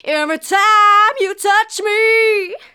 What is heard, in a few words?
Female vocals and robo-vox